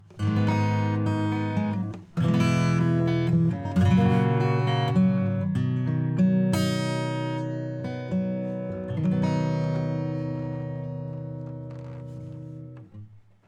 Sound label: Soft Background Acoustic; Neutron 4